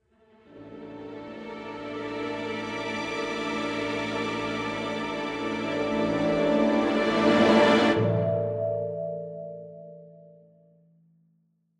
Scored Music Pool; Agent A: A Puzzle in Disguise; Windows

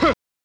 Throw; SNES; Dragon's Lair

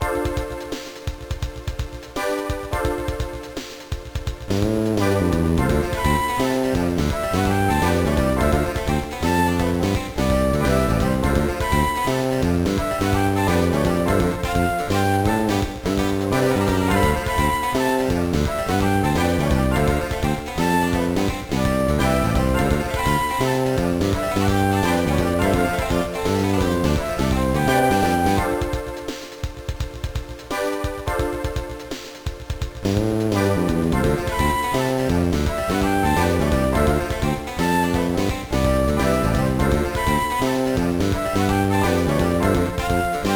BGM

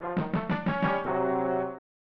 Lose Life; Adventures of Rocky and Bullwinkle and Friends; SNES

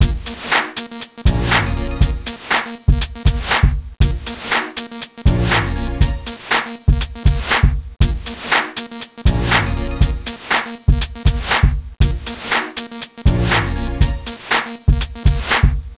Music Menus; General Knowledge for Dummies; NDS

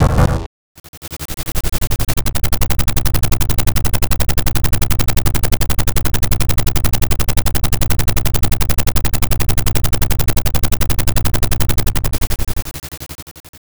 Helicopter Appearance; P.O.W. Prisoners of War; SNK; arcade

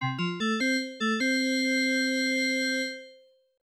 Baseball Fanfare; Tecmo Super Baseball; Genesis

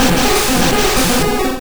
Smog; sfx; attack moves; pokemon; gen 2; gsc

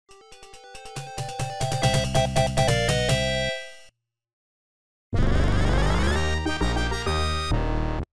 Win & Lose BGM; Karian Cross; arcade

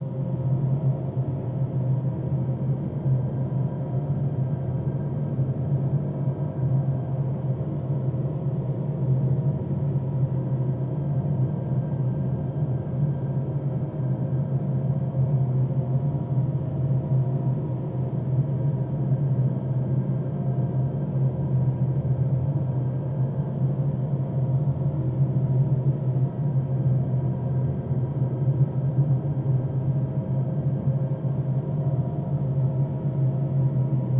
hallway ambience

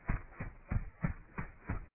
deep footsteps stereo; Five Nights at Freddy's; FNAF 4

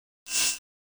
propulsion

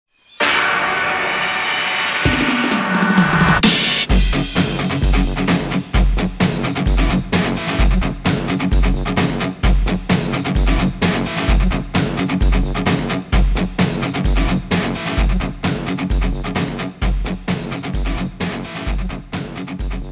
scrap boss intro; Yo-Kai Watch 3; 3DS

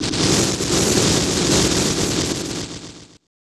Shuffle; Super Mahjong 3; SNES

